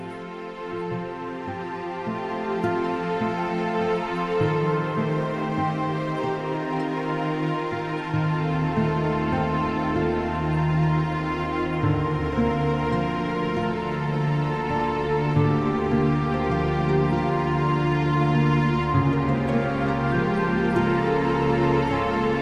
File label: ambient